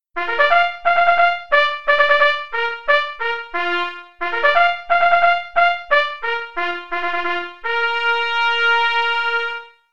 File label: Bugle Call First Call